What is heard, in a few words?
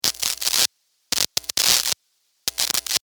sparks, Electric Spark; Several Sparks , From Guitar Cable